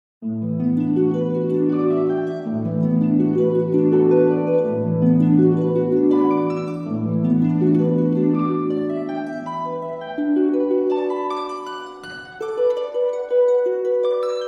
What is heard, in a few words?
twin harps